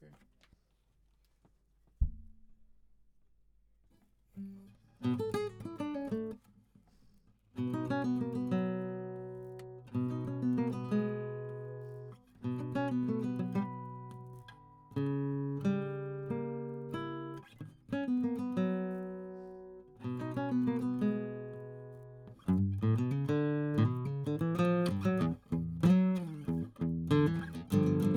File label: GTR